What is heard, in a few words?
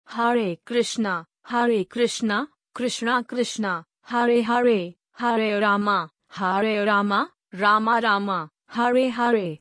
Haré Kṛṣṇa Mantra